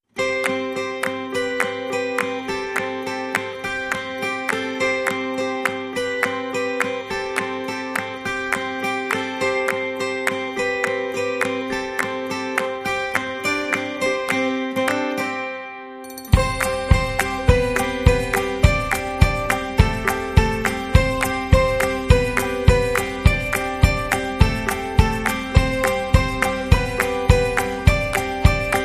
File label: Only Kick Percussion and Melody